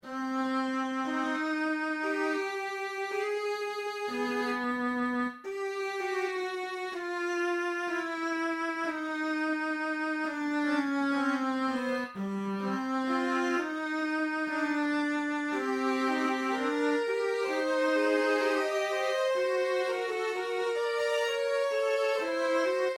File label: synth